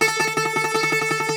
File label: Greek Bazouki, Plucked Strings